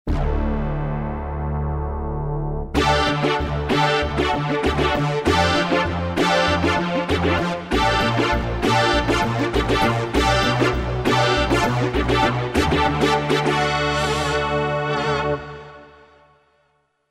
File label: MEGA BRASS; Akai SG01v